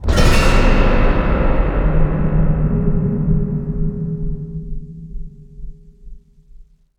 Sting Sewer Door; DLC2 Ch4

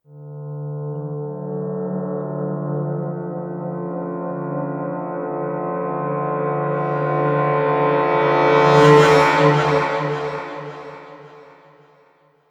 Omen Stinger; rise of nightmares xbox 360 gamerip 2011

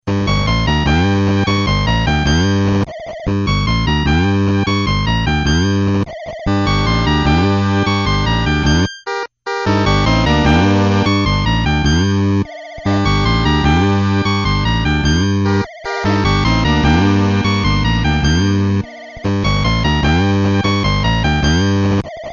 Boss Music; Toki

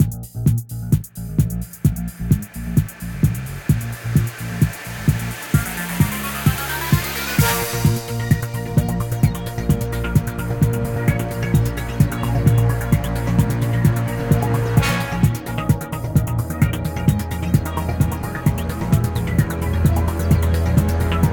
Background Music

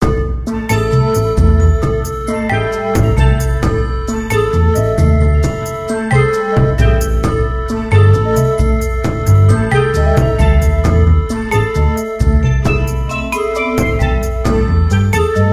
jungle machine wind; Tales of Monkey Island Chapter 1 Launch of the Screaming Narwhal

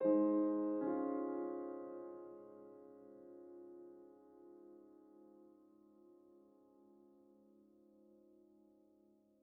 Env Field Night Ground

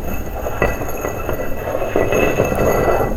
Scrape glass little

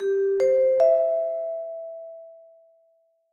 Timer Start; Ki11er Clutter; Switch gamerip

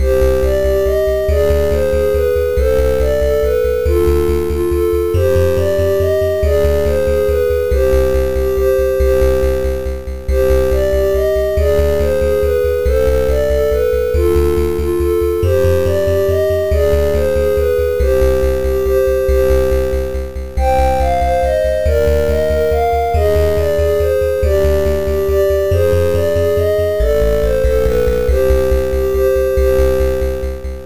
Shop B G M; Adventure in the Tower of Flight

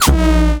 Character Hit

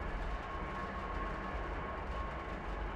Elevator Creak Loop

